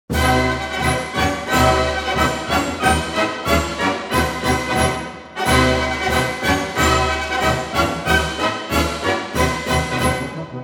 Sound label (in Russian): voennyj orkestr marsh